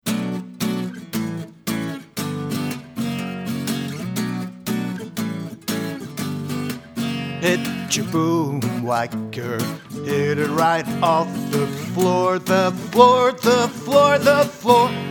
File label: Boomwhackers